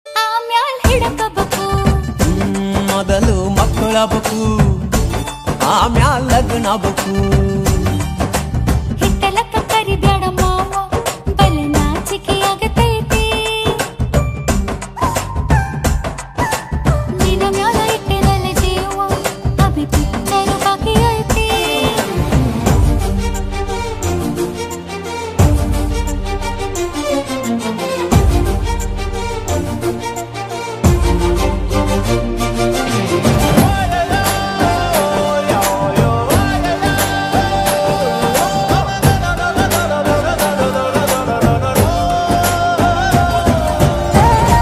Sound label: song ringtone music bgm